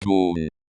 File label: gun; 군 ⟨gun⟩ /kun